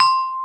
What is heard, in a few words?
SARON, ETHNIC; ETHNICSTUFF